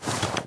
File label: weapon movement sprint; weapons